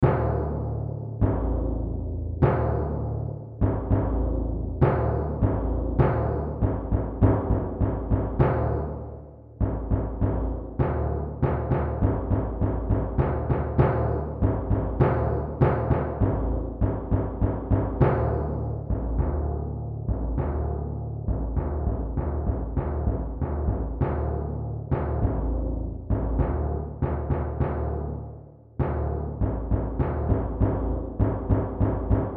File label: timpani